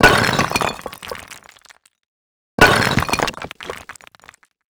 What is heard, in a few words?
Resynth Stone Break